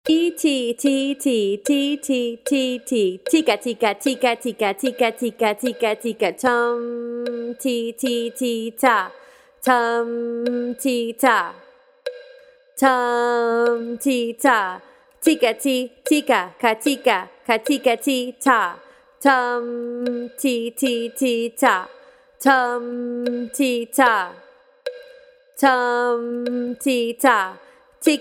Rock

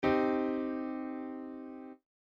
C Minor; Triads

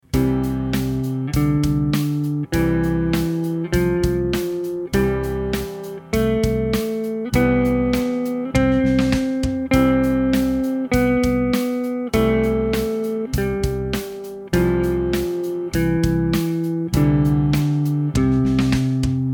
major scale

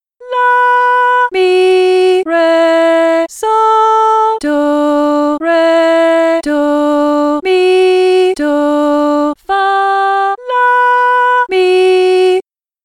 Hard Melody Solfa; Hard Melody 3

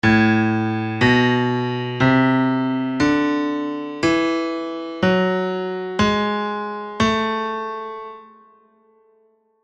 A melodic minor ascending

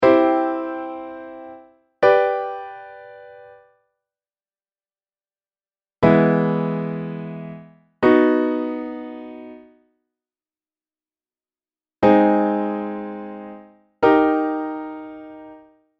Cadence Examples Half; chords

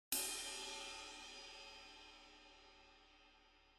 ride bow; Ride Cymbal: Bow

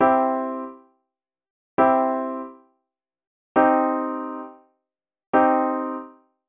Major vs. Minor; Chords

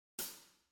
hat closed; A great drum groove is the foundation of popular music

